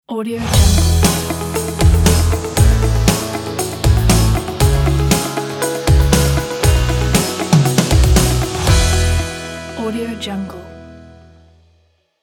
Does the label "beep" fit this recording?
No